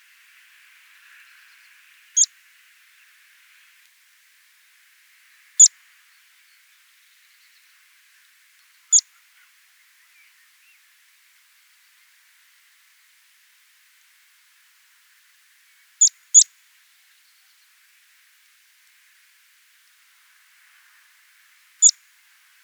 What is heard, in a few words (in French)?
pipit appel